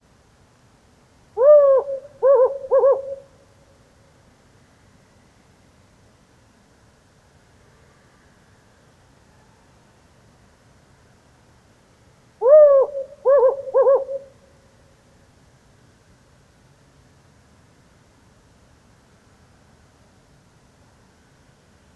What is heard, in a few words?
Humes Owl Compound Hooting Of Male During Lunar Eclipse